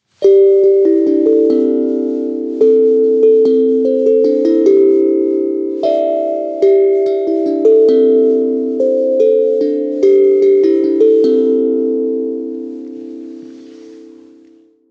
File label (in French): hang drum